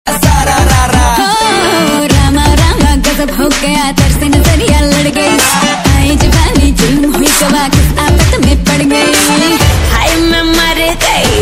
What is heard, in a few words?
Holi Song